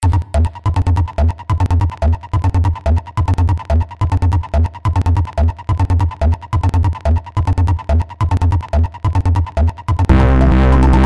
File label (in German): Glocke